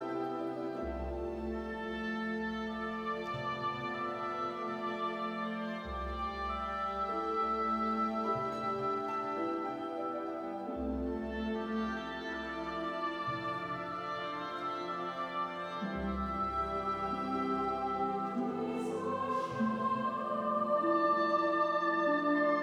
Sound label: Requiem, The Lord is my shepherd; Zwols vocaal ensemble